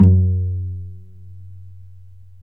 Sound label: STR VC PIZ, Chamber Pizz, String Master Series; Roland - String Master Series